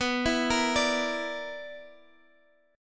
B Minor Major 7th Double Flat 5th B, Guitar, Open G